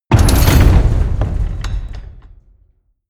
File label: gate slam medium